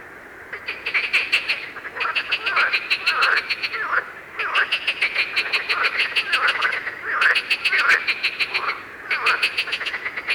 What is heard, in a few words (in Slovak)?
rana ridi cisto